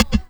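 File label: rim processed, rims, fresh disco house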